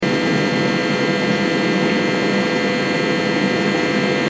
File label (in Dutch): vaatwasser zoem